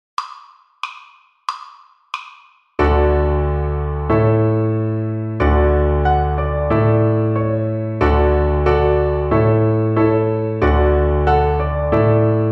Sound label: String Gym, Piano Accompaniments; Etude 18 - Tuning our E's